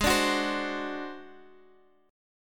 G sharp 7th Flat 5th G sharp down Guitar Half Step Down, chord